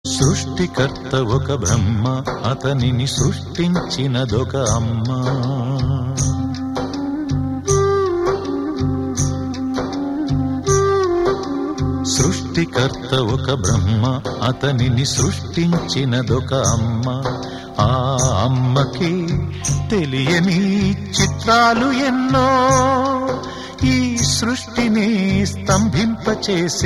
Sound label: Ringtone